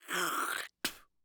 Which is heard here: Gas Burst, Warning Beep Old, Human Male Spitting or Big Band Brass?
Human Male Spitting